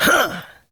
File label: Injured Grunt